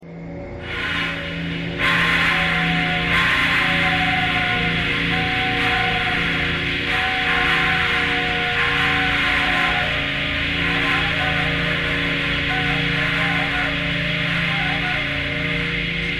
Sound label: thread pentatonic